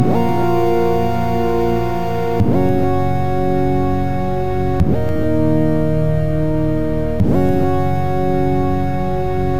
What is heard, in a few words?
Synth Texture Glide; synth loops